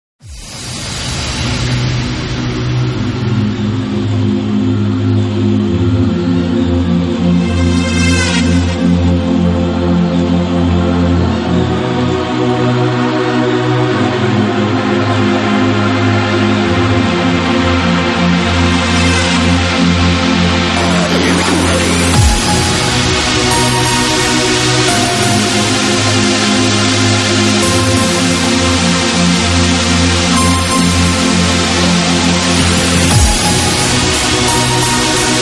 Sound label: beats n bass, happy hardcore